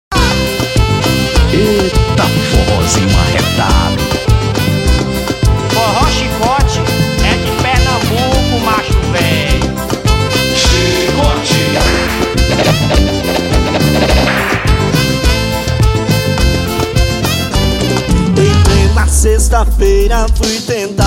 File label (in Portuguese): forró